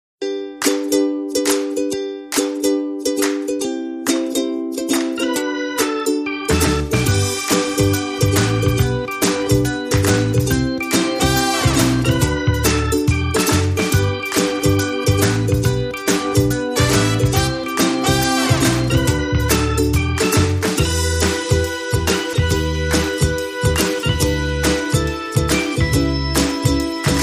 No Piano

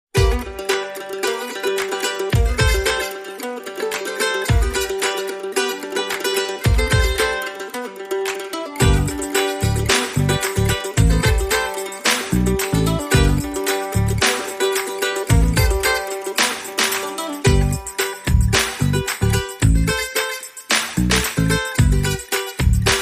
No Xylophone

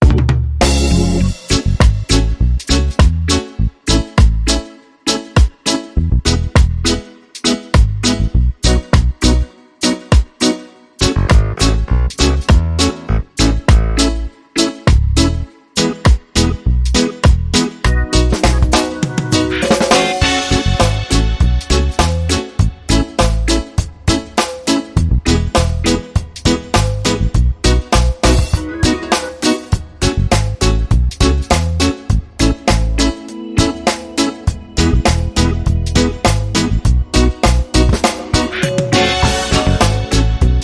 Horns, Rhythm

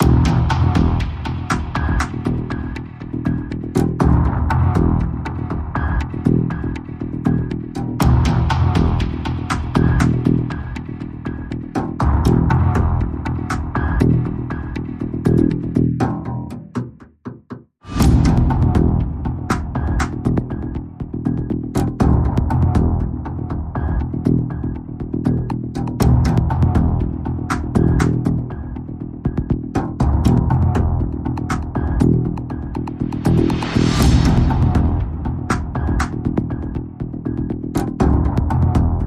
Percussion and Pulse

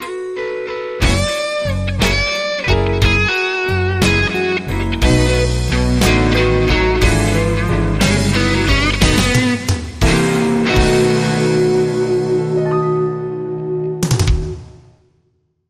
Way Too Late Blues

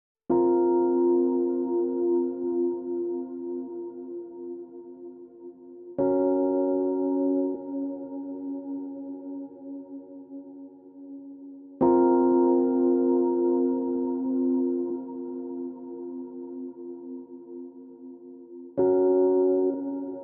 Rhodes Solo; 60sec

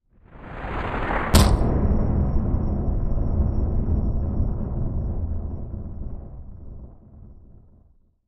Hard Impact And Rumble

